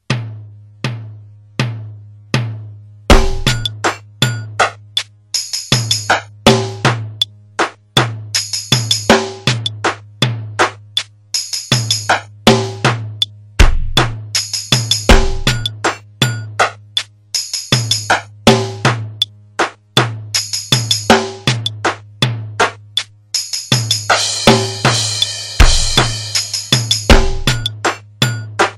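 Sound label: Rhythm Card medium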